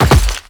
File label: Land Rock; Warframe; The New War; Kahl; Footsteps